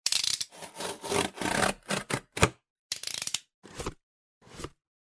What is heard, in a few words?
cut